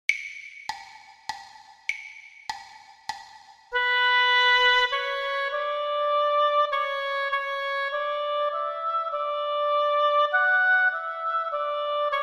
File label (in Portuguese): percepcao ditado